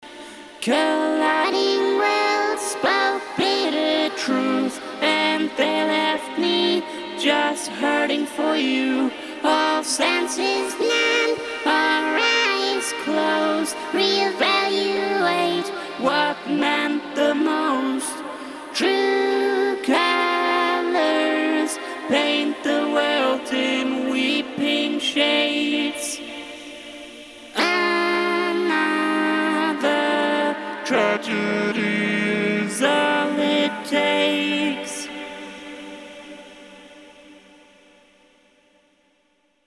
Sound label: Autotune Madness